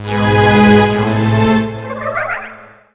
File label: Home Menu Banner Jingle